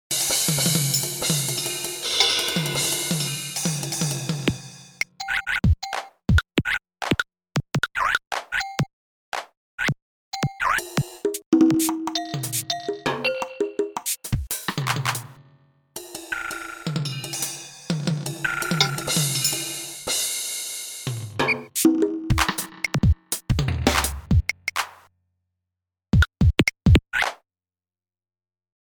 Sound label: drum solo